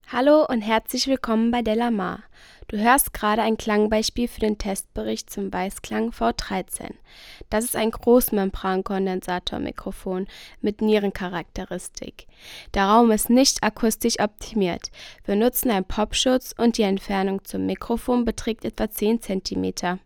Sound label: weissklang v13 vocal female 20 cm